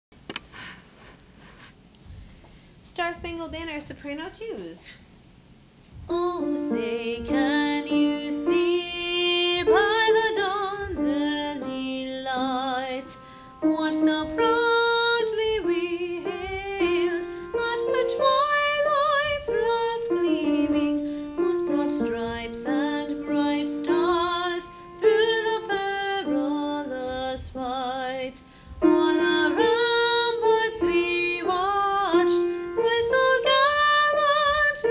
Star Spangled Banner Soprano

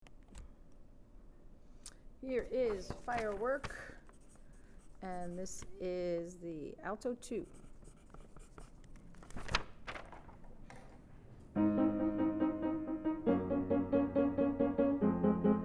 alto low

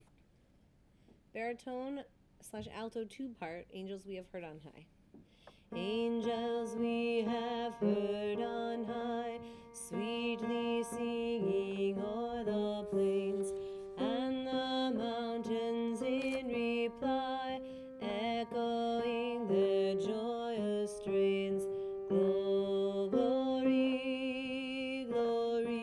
Angels Baritone

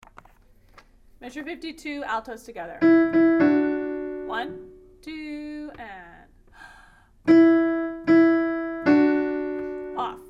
Famine altos together

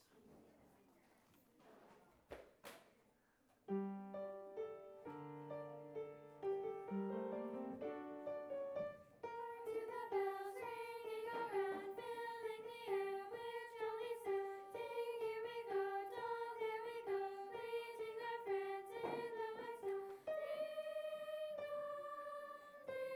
Soprano; Ukrainian Carol of the Bells

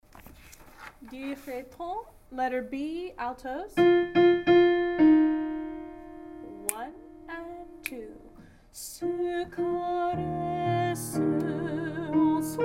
SSA Alto

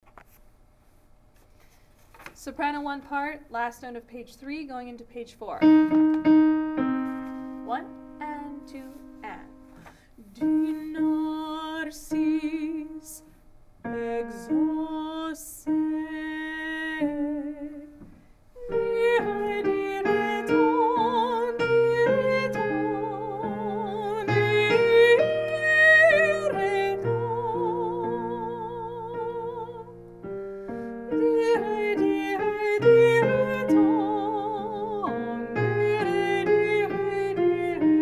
SSA sop

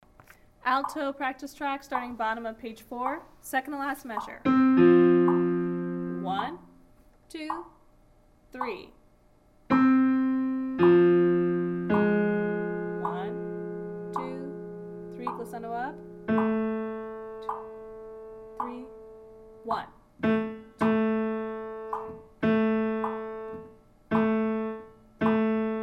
Alto

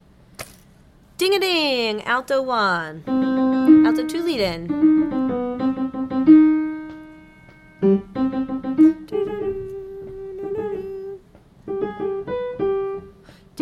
Ding Alto

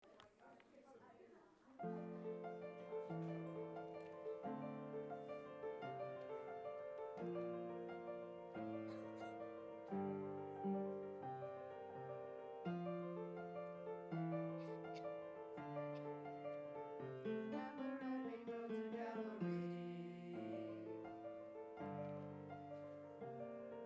alto

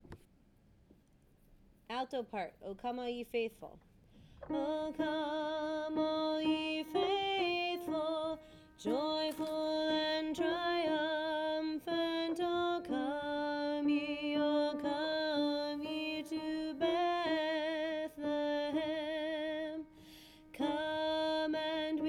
O Come Alto

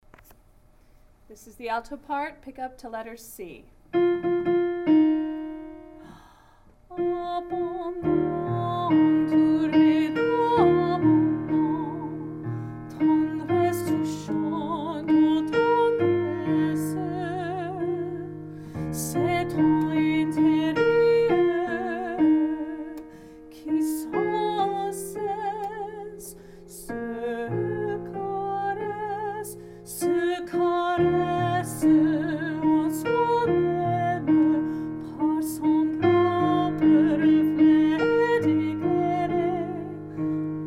SSA Altos

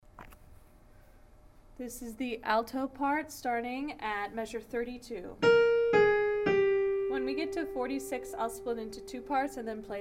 SSA Alto

